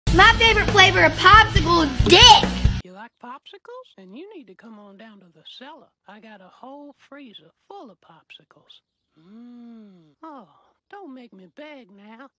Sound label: mwhahahahhahahahaha